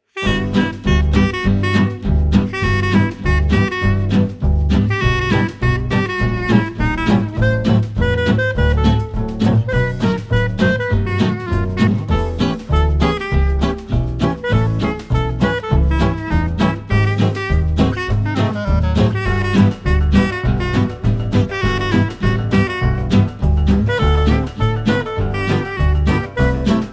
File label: Swing Des Puces, Jazz Manouche